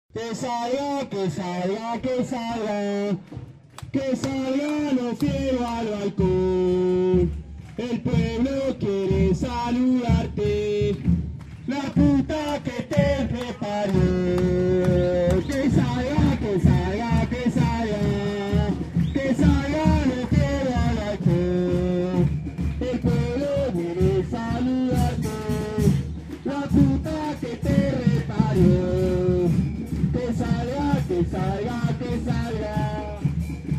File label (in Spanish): actos escrache; jose ruben lofiego; mendoza y paraguay; Rosario